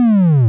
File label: beep decrease